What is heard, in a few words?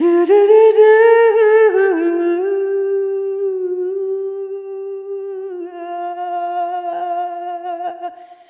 Arrhythmic F